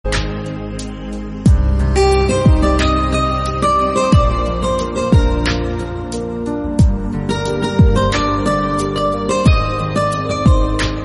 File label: music best tune